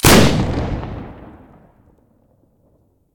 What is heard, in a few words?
aa12 muzzle stereo, weapons; STALKER; IWP